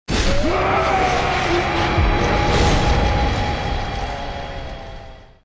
death scream; artorias

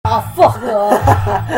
cringe

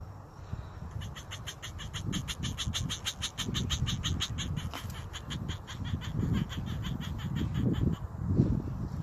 male crested gecko mating calls churping

